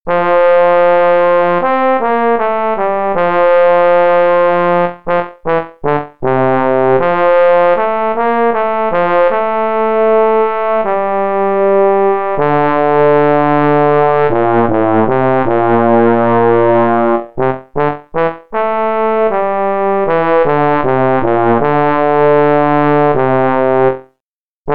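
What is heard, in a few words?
trombone slow; Region 23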